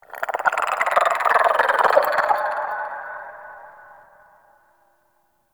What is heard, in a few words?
Infested Tendon Chatter; Warframe; Corpus Infested; Ambience